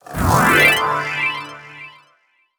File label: Keyboard Soloist Attack Underlay